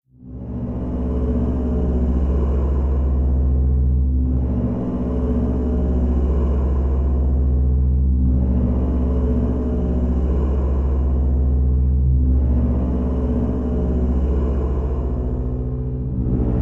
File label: Deep Low Bass, deep